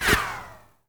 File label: laser shot, sci-fi